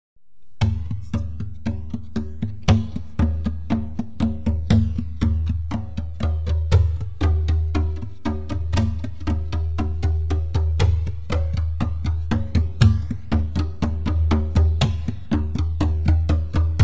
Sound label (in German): afrikan trommeln patternmix